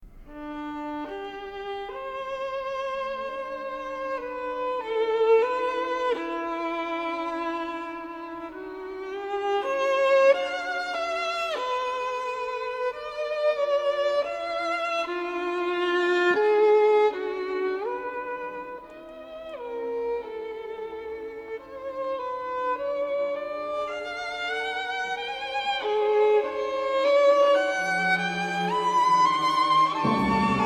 violin concerto

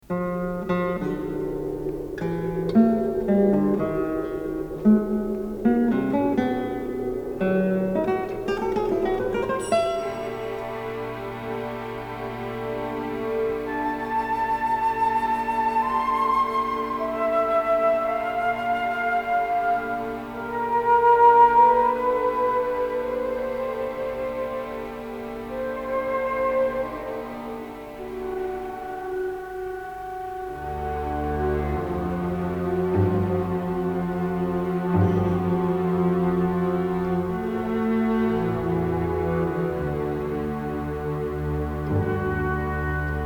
prelude for guitar and orch

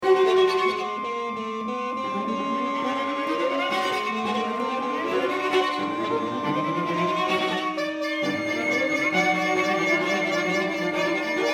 marching

